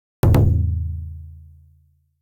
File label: drum japanese